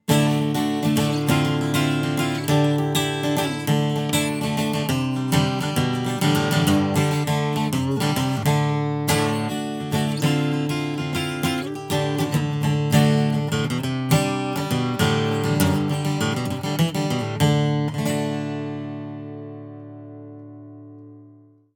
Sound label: ORTF